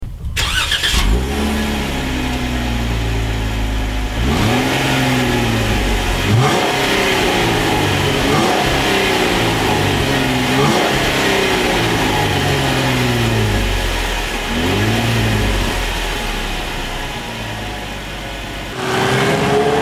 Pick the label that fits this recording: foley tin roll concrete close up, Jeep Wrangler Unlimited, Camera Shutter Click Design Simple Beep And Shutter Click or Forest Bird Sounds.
Jeep Wrangler Unlimited